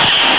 Augh!; SNES; Champions World Class Soccer

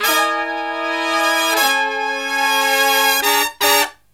SLEEP, Killer Brass Riffs

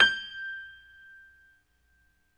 PNO, Grand Pno; Club-50 - Foundations Roland

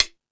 House Machine, E-MU Pro-Cussion

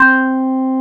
Korg CX3, C 1

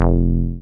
303, TB-303 BASS

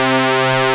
JUNO TRANCER; AKAI S6000 CD-ROM - Volume 1; SAMPLE WAVE MIXING 2

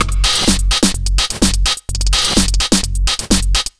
127 beat 05 R, Fresh Disco House; USB Soundscan vol.20; AKAI

